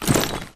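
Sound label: grunt movement foley loud medium; Titanfall 2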